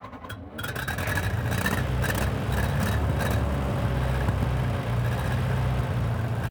start engine, engines; World of Tanks

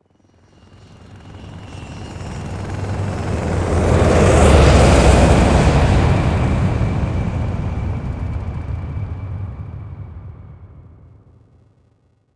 flyby short; buraq

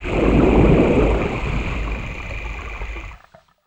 vehicle sdv stop; veh sdv plr stop

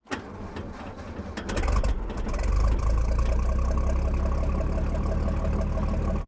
engines; World of Tanks

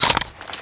shot attach reload clip in, foley

